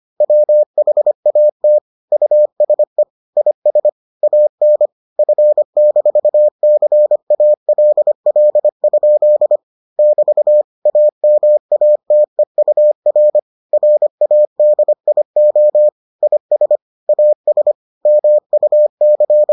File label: morse